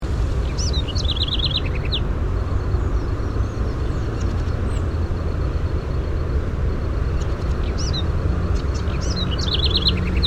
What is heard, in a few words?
troglodytes aedon; curitiba